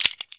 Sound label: m3 insert, firearms weapons